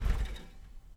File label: vehicle sportscar turnoff